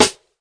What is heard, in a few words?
classic hh Snr2, Classic Hip Hop; STYLE KITS (Hip Hop, Etc); Classic Hip Hop Mega Kit